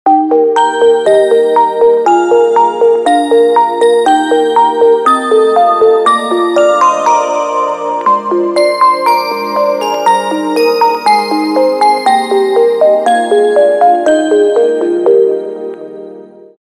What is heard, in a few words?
A Toy Box; Samsung X01SC Omnia PRO